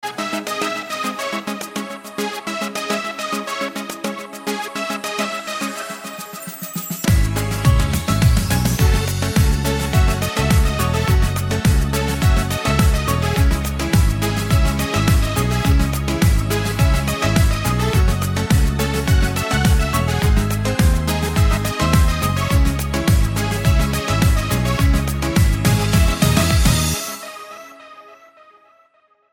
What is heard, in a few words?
Music of Russia